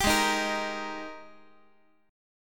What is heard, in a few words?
G sharp Major 7th Suspended 2nd, Guitar; x,x,10,6,7,9; JGuitar